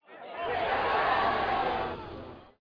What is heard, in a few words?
crowd ball wait